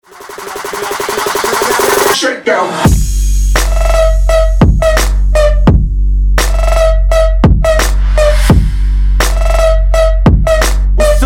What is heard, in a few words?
jackal shakedown trap